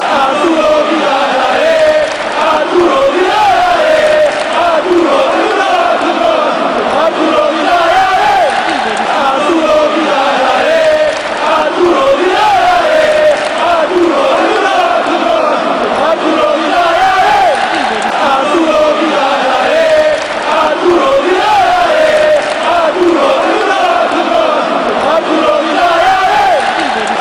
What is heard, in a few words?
fanchants; arturo vidal